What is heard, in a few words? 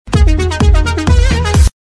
Samsung Galaxy J5 notification